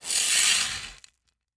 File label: Industry Tool Gravel Raking With Metal Rake Single Movement